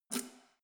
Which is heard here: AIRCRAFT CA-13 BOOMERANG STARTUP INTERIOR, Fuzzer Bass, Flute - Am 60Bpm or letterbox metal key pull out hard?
letterbox metal key pull out hard